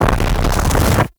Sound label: Eruption Build Up; Warframe; Sargas Ruk